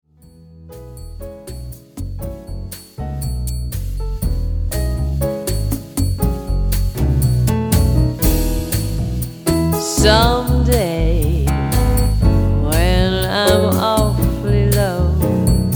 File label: quartet